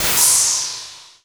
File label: noise fx; Masterbits; Switch FX